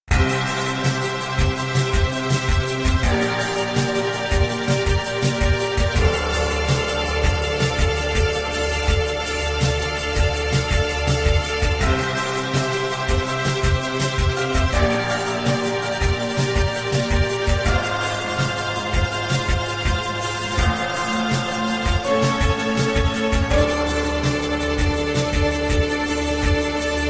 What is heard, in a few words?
Clouds Motorola